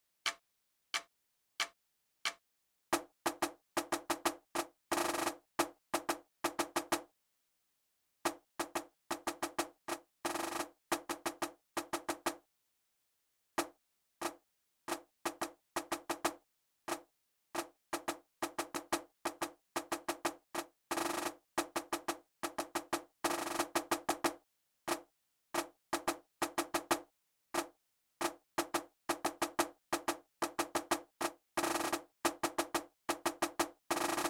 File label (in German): Jubilaeumsgruss - kleine Trommel [90]